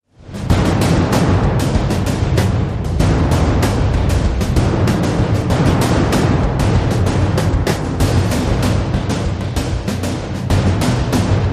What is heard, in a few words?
Driving War Drums